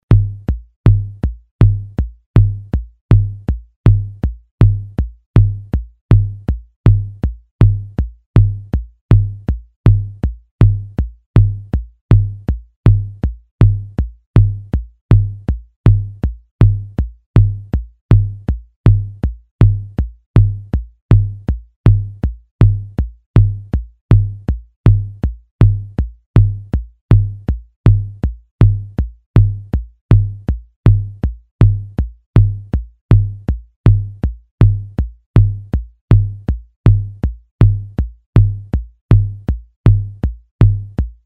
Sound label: Polka rhythm at learning speed